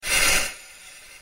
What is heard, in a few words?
slide exit; Jet Set Radio movement sfx